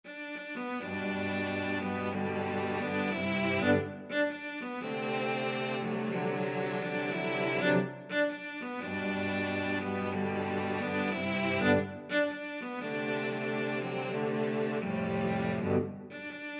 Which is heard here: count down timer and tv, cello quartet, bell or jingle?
cello quartet